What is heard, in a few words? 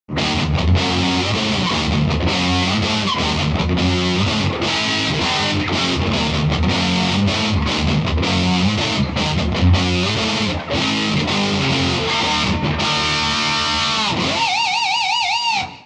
badcat double gain